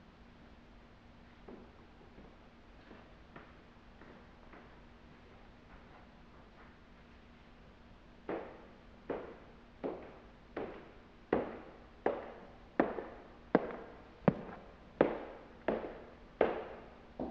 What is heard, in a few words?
Footsteps